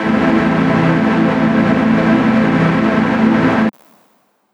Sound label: SAW A4 MINOR